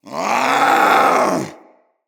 Vocal Alerted, Hunting Critters; Characters; Vulpaphyla

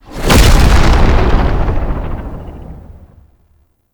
Undercroft UI Portal; Warframe; Duviri

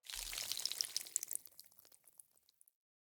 Water Shallow Exit Drips Dirt; Entity Contact Movement

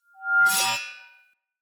Short Sword Whoosh Heavy Accent; Weapons; Player

